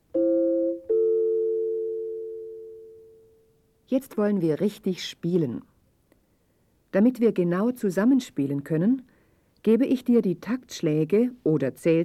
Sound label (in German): Spiel Blockfloete